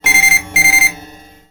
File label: alarm, vehicle